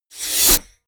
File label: sweep